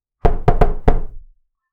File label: knock door